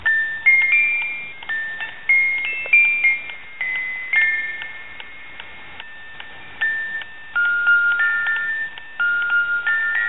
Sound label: Sad Music Box Theme